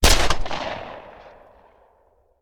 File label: Hand Gun; Sound Ideas; Guns